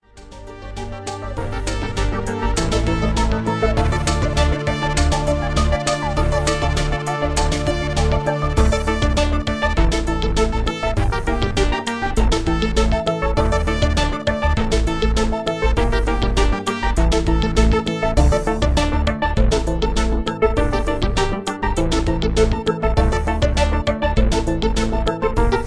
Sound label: Electro Ambient